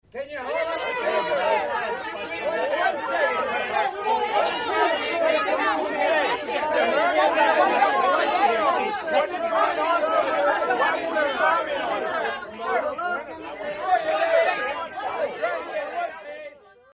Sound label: Reporter Walla Yelle, questioning walla